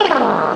brrrrrr, Voice

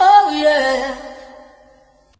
"oh yeah" sung

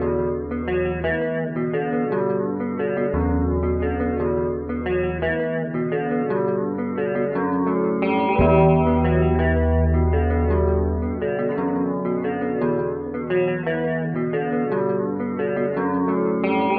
guitar unending, Sad; Loops

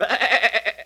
sheep; Animals

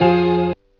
another note, Guitar

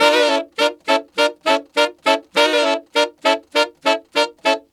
sax; Phonky

